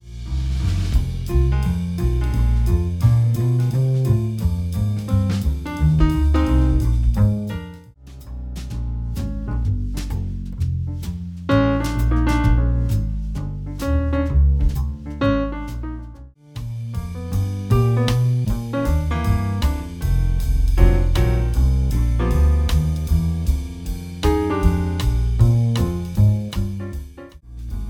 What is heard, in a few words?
jazz trio